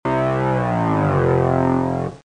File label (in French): Son perdu, Cartoon; bruitage